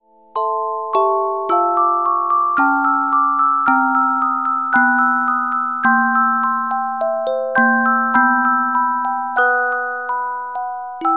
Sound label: Carillon Music Box Melodies